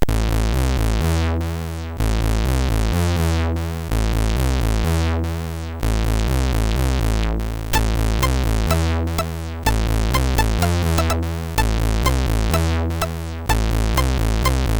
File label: Action Drums, MOS6581; sid; MOS6581R3